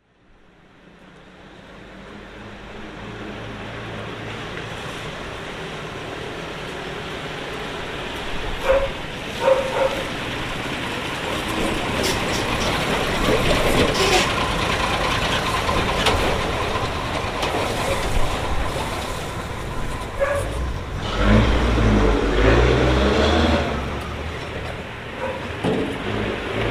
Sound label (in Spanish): calles vehiculos frenan en loma de burro; laprida y arijon